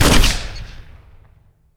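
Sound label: Weapon grenade machine gun fire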